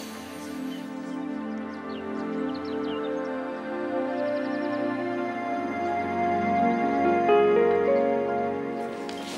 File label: Soothing Transition, Music